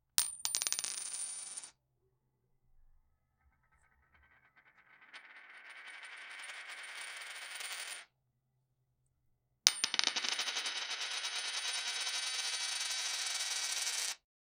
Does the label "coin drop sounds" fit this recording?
Yes